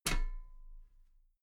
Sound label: metal ladder step soft